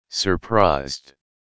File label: surprised